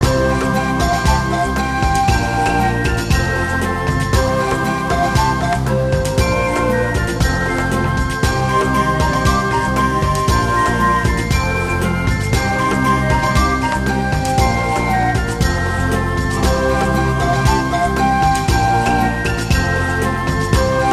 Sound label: Heart Rainforest